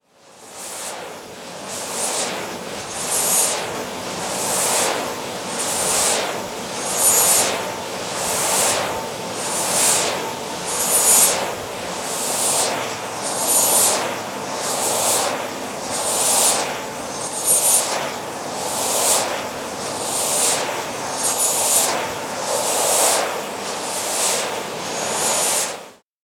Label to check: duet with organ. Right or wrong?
Wrong